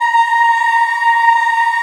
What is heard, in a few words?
A#4 CHILD, Choir; CHILD IHP